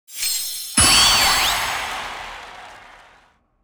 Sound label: League Rankup Reward; disney star smash android ios mobile gamerip 2020